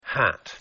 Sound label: hat